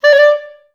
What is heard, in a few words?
D4, Advanced Orchestra; Best Service